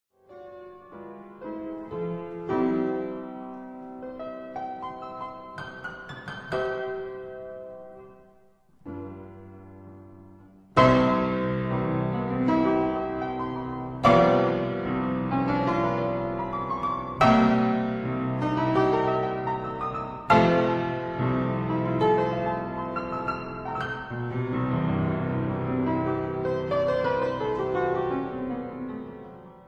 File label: Keyboard Sonata in F major, Andante con espressione; Op. 74